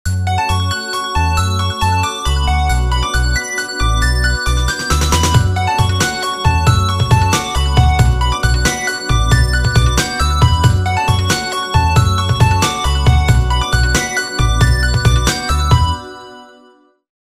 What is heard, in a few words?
Spring water; Sony Ericsson; C903